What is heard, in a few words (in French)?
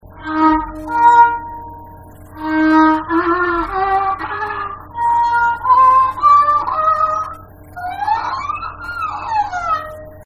Sample Erhu gamme